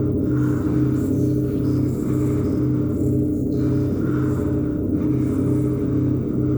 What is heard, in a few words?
SFX loop; Disney's Dinosaur